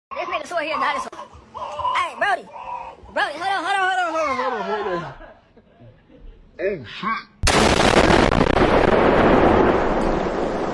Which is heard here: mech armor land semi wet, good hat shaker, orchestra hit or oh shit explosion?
oh shit explosion